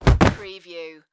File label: Body Fall; sound effects bible